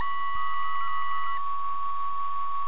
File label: Homing Beacon